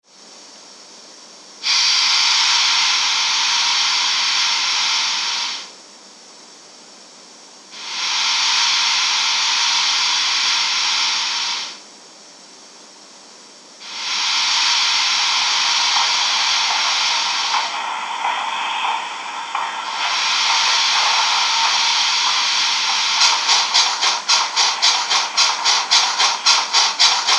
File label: Steam OV